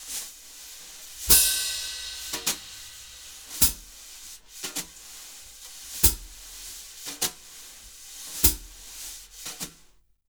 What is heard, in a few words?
BALLAD, Jazz Latin Drumloops